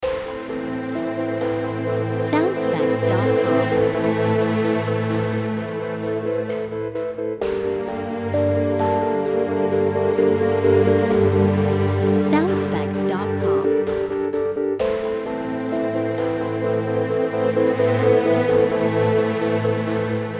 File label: Dark And Mystical Short